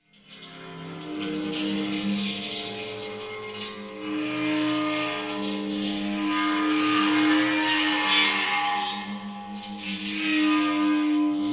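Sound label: Dry Ice Eerie Fluctuating, dry ice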